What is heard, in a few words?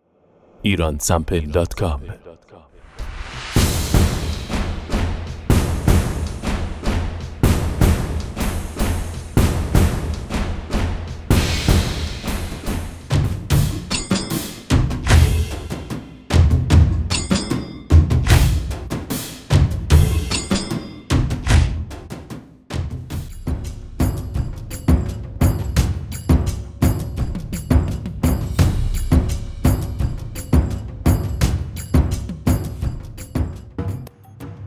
percussion religion; percussin religion collection